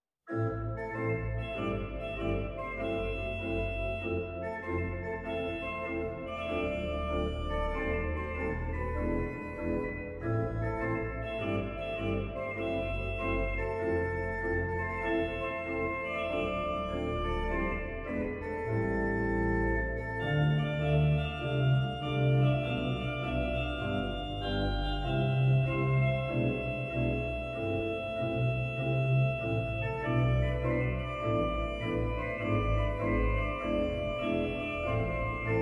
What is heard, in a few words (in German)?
Orgelversion; Aristocats